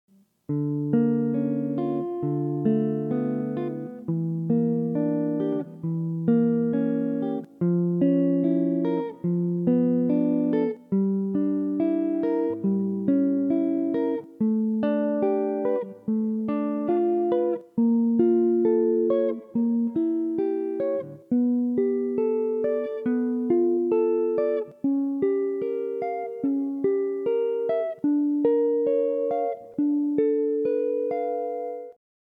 Min 6th and Dim Chords borrowing, jazz chords; Barry Harris harmonization

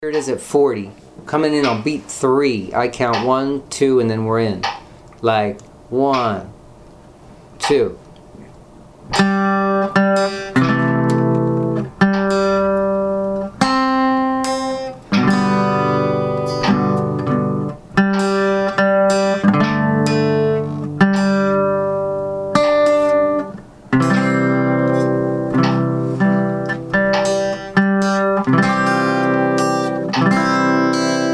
happy birthday at 40 bpm

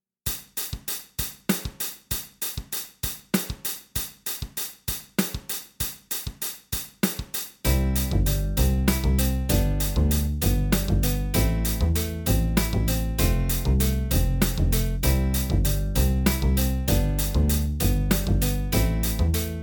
3 vs. 2 backing

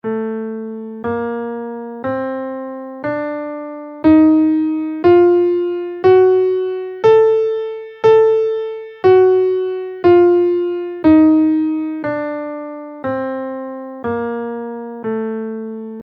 locrian bb7 scale in Am, guitar

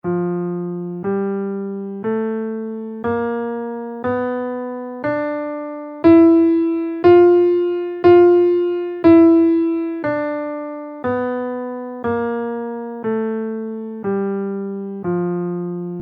ionian b5 scale in F

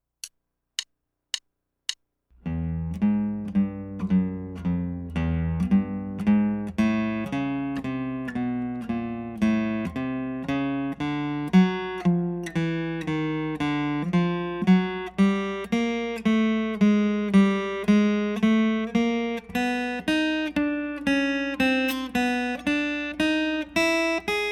Walking Guitar

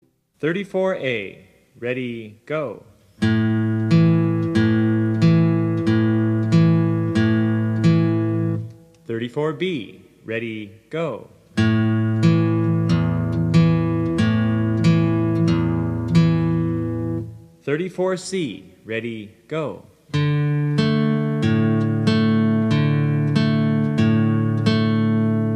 travis picking

